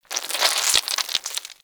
Acid Squelch; Ambience; Bromius Inside

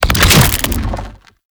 Head Spin; Warframe; ManInTheWall; Octopede

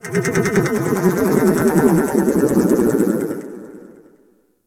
Landscape Textures, Ambience; Venus Open World; Objective Rooms

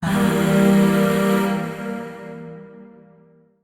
Void Shawzin Phrygian Chord; Warframe; Zariman; Chords

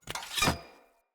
Player Sphere Polearm Equip; Weapons

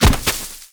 Bird Of Prey Bodyfall Grass; Hunting Critters

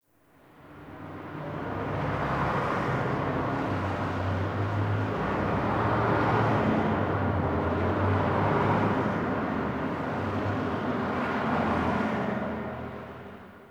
Distant Traffic M; Warframe; Ambience; NineteenNinetyNine

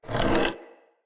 Wood Stool Drag Scrape

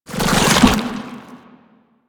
Walk Action, Foley; Enemies; Warframe; Proto Infested; Babau

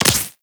Player Laser Impact Flesh; Warframe

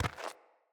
Walk Gravel, Footsteps; Enemy; Mockery; Mockeries

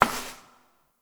Step Perforated Metal; Warframe; Koumei

